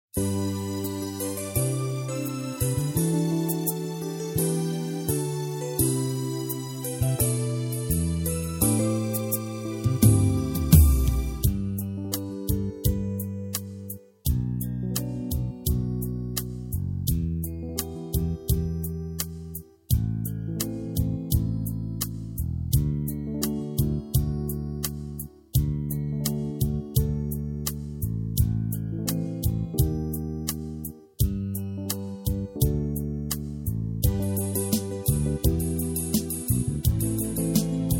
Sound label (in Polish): prawo milosci instr